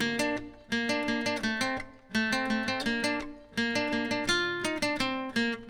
Acoustic Guitar; Guitars

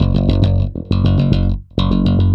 Electric Bass; Basses

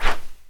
player attack; theGoonies; Retro

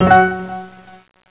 Droid Spawn; Bombzuka; Retro

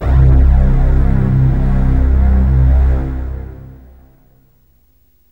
LOW STR, BASS; SoundFactory; SMPMANIA

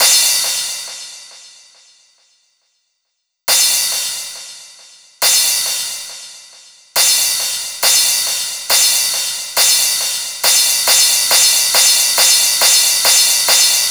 Cymbal Loop, Dance Beats; Drums

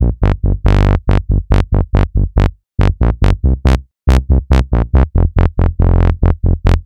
Synth Bass; Basses

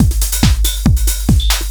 Dance Drumloop; Drums; Dance Beats

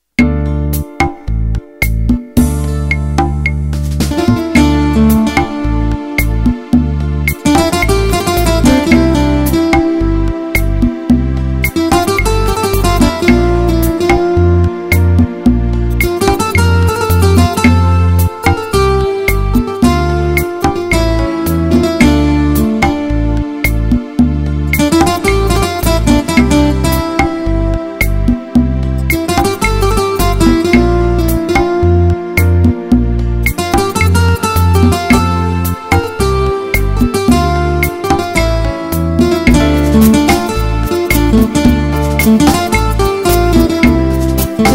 Spanish guitar; RKO-2002 Complete; Video Games

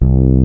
C1 FRE BAS; roland1

